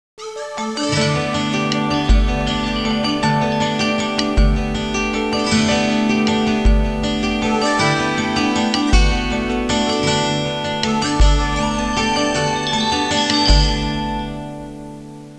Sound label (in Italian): suonerie musica hawaiian